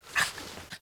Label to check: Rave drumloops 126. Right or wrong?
Wrong